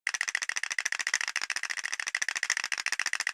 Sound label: Skull Clack